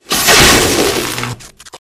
hardpoint electricity; Titanfall 2

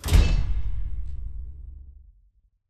spectre power up light on; colony; Titanfall 2

